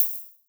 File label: HH, HIHAT; 90 s Sample CDs; Best Service Dance Mega Drums